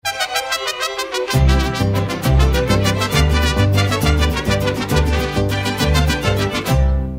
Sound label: mariachi